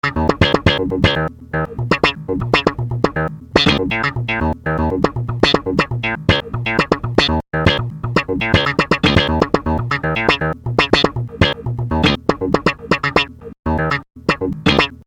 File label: bass bits